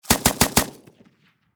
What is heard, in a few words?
Warfare Machine Gun 7.62 Caliber Pkm Russian Four Shots Distant Perspective